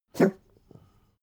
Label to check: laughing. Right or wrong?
Wrong